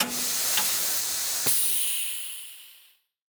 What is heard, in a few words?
spare tyre; milebymile